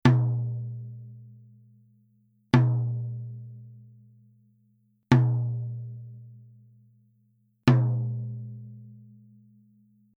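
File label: TOM Power Center Soft, drum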